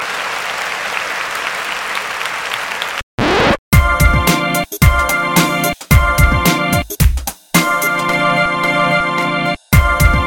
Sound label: Goodbeat